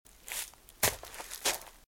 Footsteps - Leaves - Shuffle; RadwinIsland

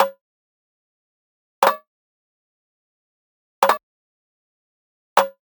Digital Click Neutral

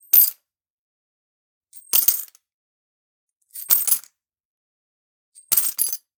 Generic Click Metal Small

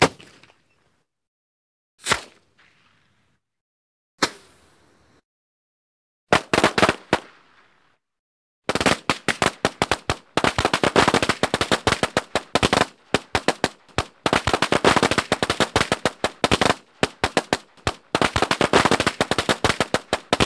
firework; best service Blue Box

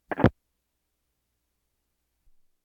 Household Phones

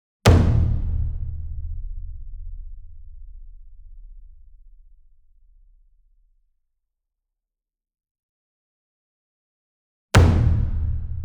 SINGLE DRUM Taiko 60 Inch Hit Hard Beater Medium; boom library; Cinematic Strikes Construction Kit

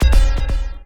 Menu Synth Tone; Menu FX

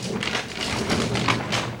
Roll Metal Cart Loop; SFX Kit; Foley - Misc

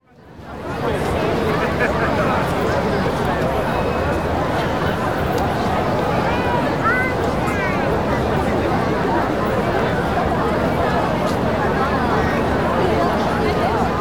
Crowd Outdoor City Park, Walla; Sound Ideas; Walla Series

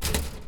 gate clang; blindside

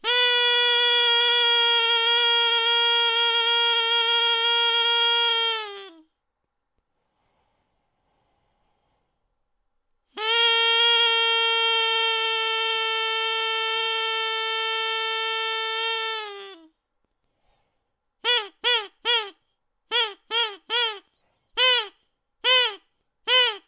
noisemaker; noisemakers; NS Studios - ultra sound collection

